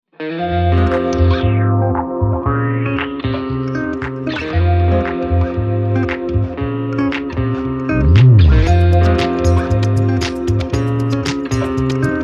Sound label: Funk Groove Nature